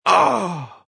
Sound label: Grunt Impact "Ah", Male grunt, impact; Groaning